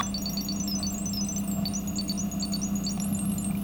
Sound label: Electornic Noise